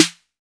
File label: TR-808 Snare; Roland BK-5; Snares